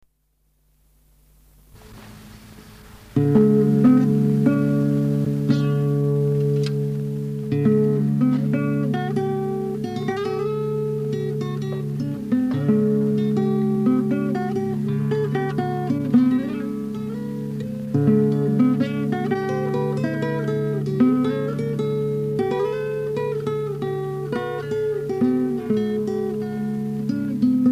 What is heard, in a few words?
acoustic Instrumental